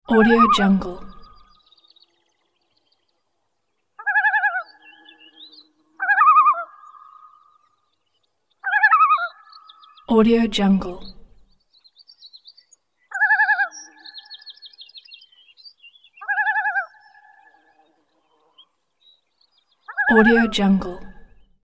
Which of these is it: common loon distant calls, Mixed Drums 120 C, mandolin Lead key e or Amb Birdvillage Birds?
common loon distant calls